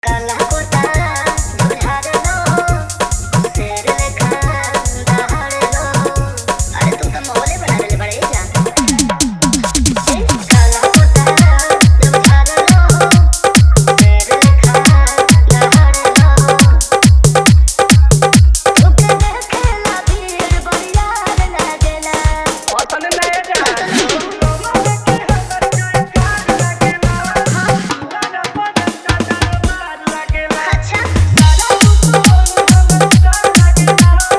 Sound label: Hard Dhollki Bass Mix